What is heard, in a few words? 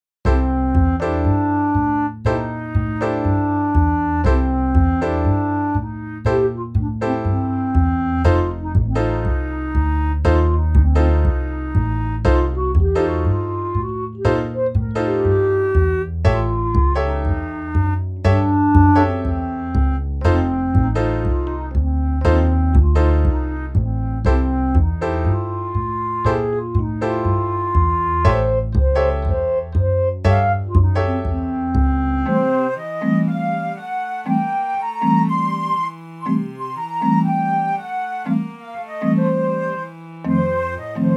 MIDI blues; spellman studio